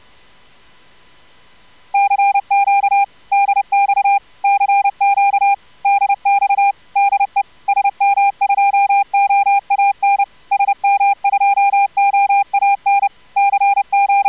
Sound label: CW jitter with corr